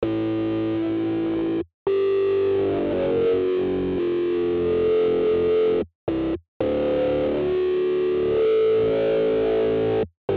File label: Bass Section